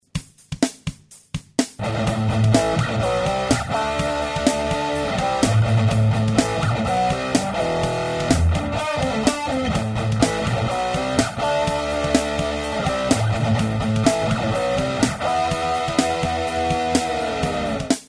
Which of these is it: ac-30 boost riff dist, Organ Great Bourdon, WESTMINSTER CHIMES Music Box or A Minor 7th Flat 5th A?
ac-30 boost riff dist